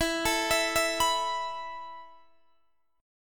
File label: E Suspended 4th E down Guitar Open D